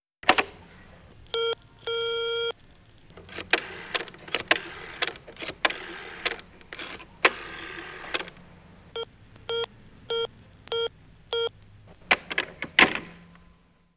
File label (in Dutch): tel hoorn nummer bezet